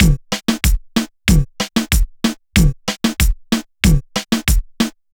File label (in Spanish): Dembow Reggaeton con Procesamiento; dembow de reggaeton reparado flp mixing and mastering